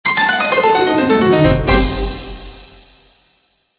ringer; Ringtones

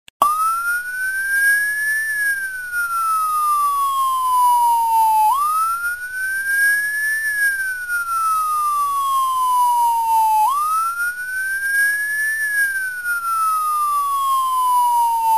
swail

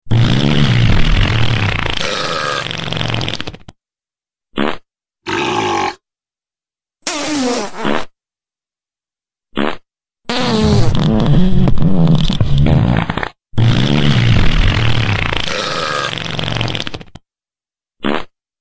Serious Gas Problems

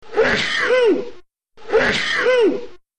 Sneeze

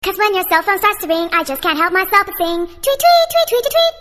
ring song; Ringtones

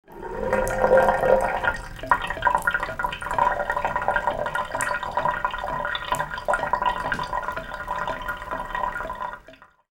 water drain

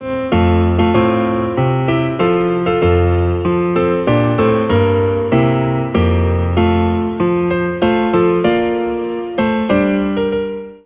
ringer; Ringtones

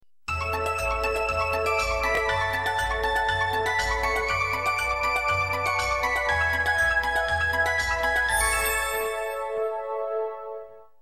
shinjuku station

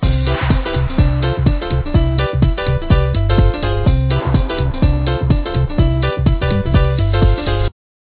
ringer; Ringtones

